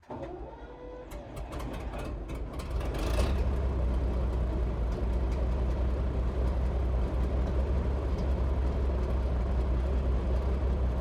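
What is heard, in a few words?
panzer iv ext start idle steady blips, tank; Panzer IV Ausf. G; Pole Position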